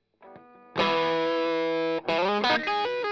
Strum Line

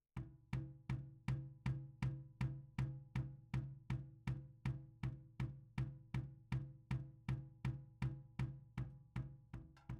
Snare Bottom, 160 BPM

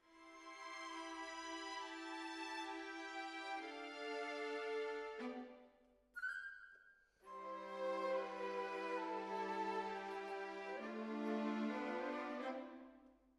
Decca, Jazz for piano

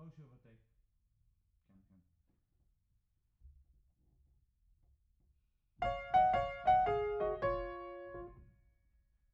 Piano Mono

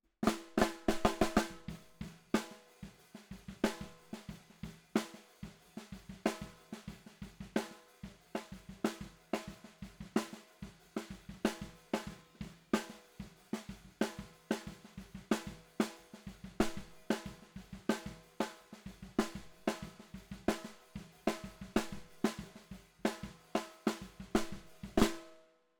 SNR Bot

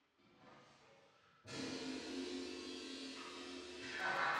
Drums Verb

